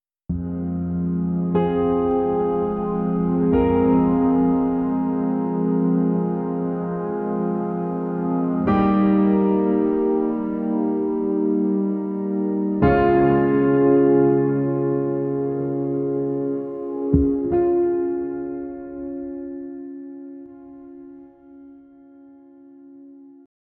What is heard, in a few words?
very emotional instrumental